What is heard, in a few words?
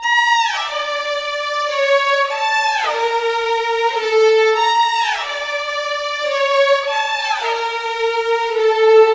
Violins, Cinematic; Sonic Foundry (Sony Creative Software); Orchestral Series VOL-3; Cinematic 11